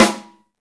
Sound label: SNR, Roland; Club-50 - Foundations Roland; Hybrid Kits; Thin 6